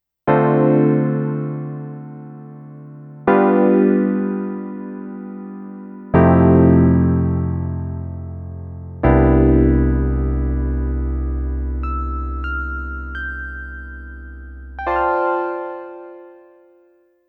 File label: Startone MK 400 E Piano